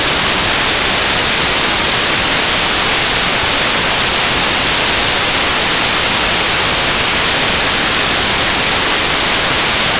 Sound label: white noise